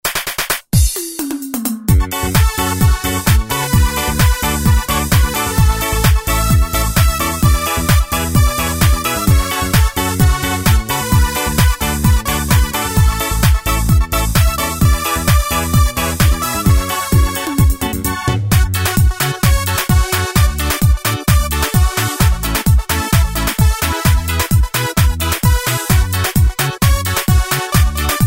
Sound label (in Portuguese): ritmos roland g70 ritmo kuduro